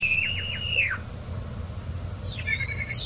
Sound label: starling; birds